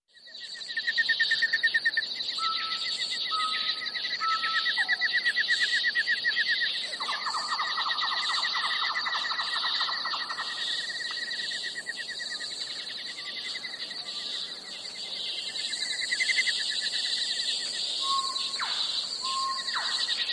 Night Jungle Ambienc, backgrounds

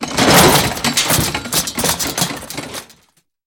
Body Fall On Metals

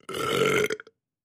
Med Chunky Belch

